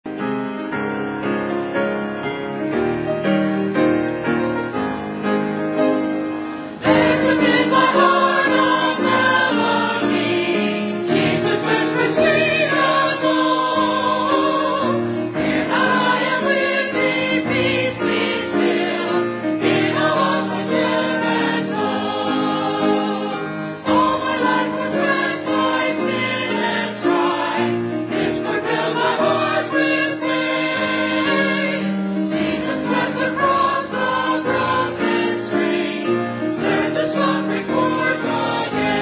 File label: choir